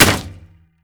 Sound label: bow shoot soft; tf2